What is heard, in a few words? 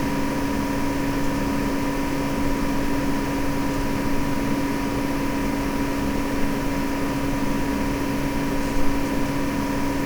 room tone; E-MU Producer Series Vol. 3 – Hollywood Sound Effects; Ambient Sounds; Room Tones